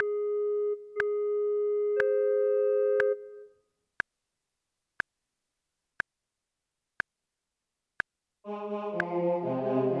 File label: O clap your hands; Gibbons